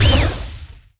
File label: pixie attack; Crypt of the NecroDancer